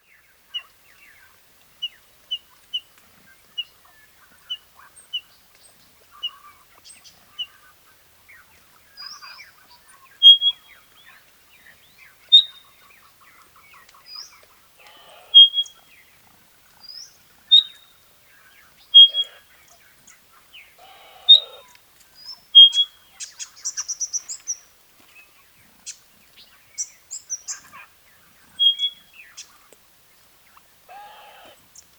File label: Pardalote, پرنده